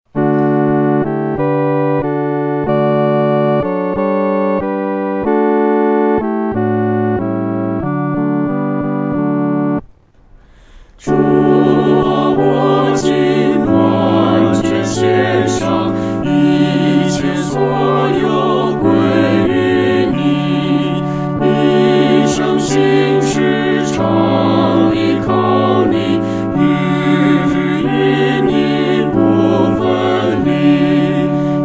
合唱 四声部

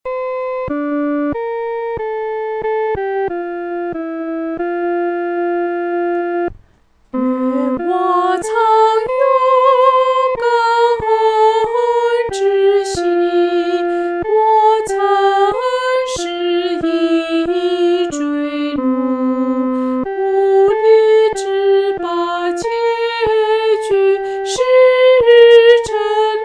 独唱（第一声）

